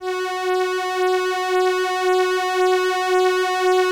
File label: LAMBDA, String Machines